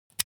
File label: object socket pull out usb cable fast